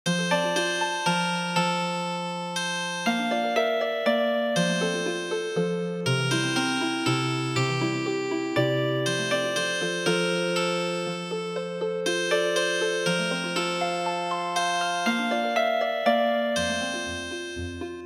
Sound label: Healing Harp